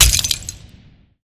symbol Explode; shangrila

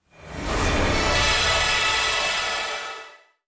random Symbol Transform; fairyred

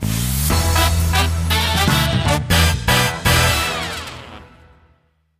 intro Free Spin; king of slots

